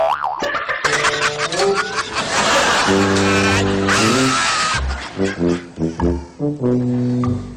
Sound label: toink haha